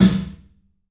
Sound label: CLUB SNARE; 3D Sound; 3D Drums